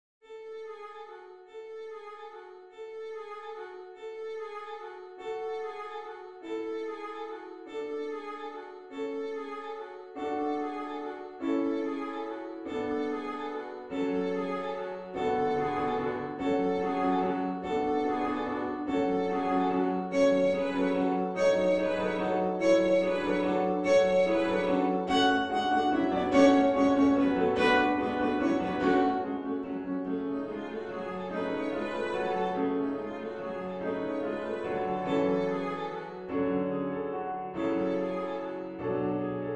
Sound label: carols of the bells; leontovich mykola